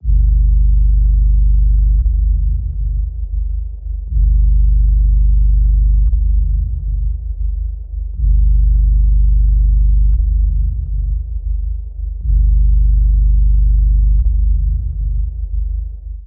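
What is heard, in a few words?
Timekeeper Idle Engine (118)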